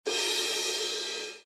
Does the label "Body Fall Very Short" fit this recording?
No